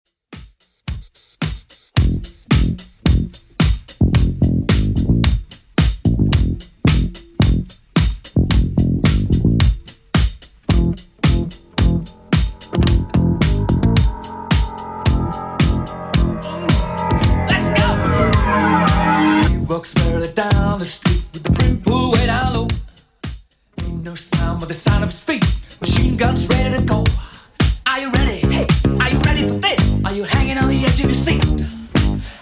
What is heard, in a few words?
80s Redrum 110bpm